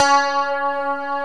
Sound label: GTR, Clean E.Gtr, Ultra Sheen; Roland LCDP02 Guitar and Bass